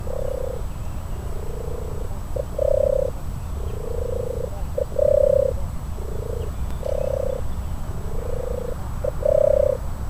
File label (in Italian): canto verso Tortora selvatica comune streptopelia turtur turtle dove tortola europea; turteltaube; bioacustica